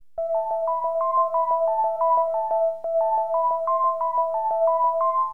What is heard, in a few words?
SYN PNO, HipHop Keyboards